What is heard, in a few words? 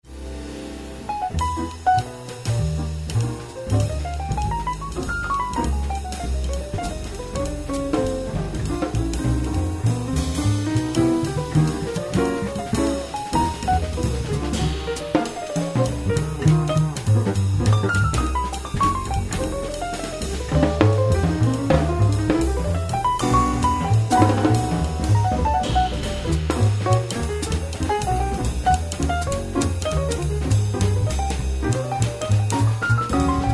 Trio